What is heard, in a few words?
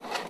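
swung metal object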